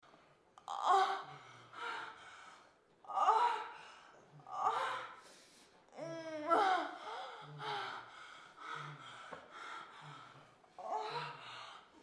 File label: sex, moans; The Witcher Hunt